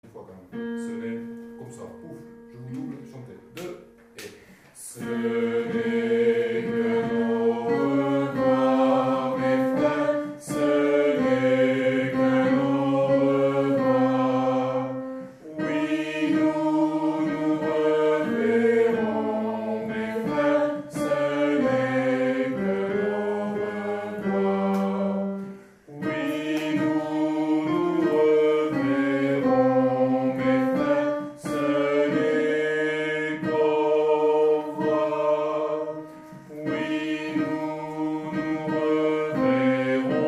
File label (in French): Ce n'est qu'un au-revoir Alti